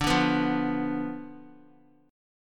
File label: D Minor 6th Guitar; Dm6 strummed; x,5,2,4,5,x